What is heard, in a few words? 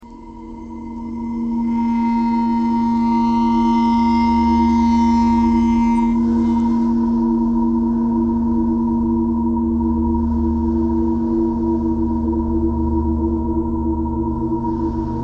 traffic kcore birds a ice bowed vibes thread simple; bowed metal